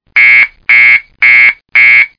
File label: alarm high; zabbix